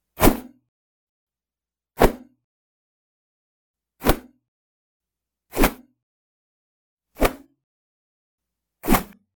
Textured Whoosh

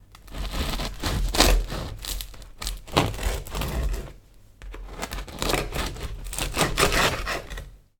Cutting Crisp Bread

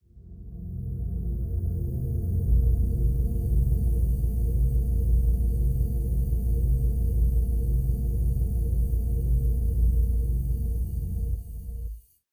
Spatial harm

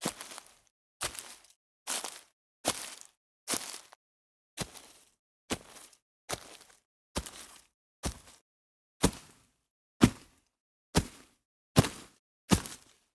Footsteps Leaves Stereo

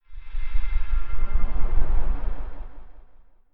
Healing Wave

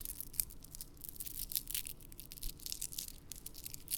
Stone Beads Rubbing Together

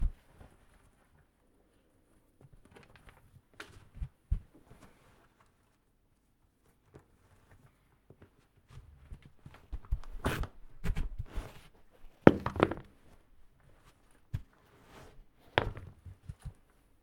Removing Shoes After Coming Home

